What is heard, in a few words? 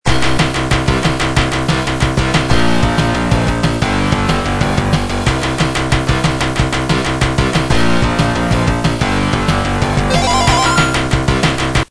8bit